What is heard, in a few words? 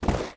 quick stop; secrets of christmas